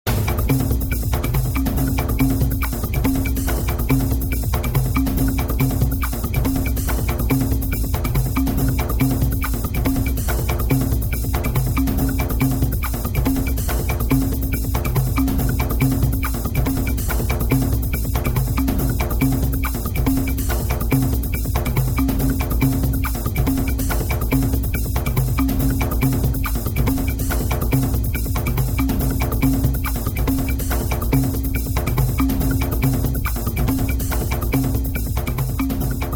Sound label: drum fest; CooperSoundMp3Lib; SoF